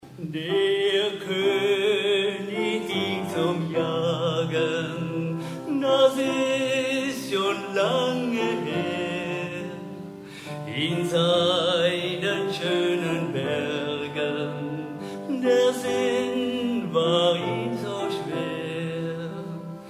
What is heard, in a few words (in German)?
Konigsjodler kurz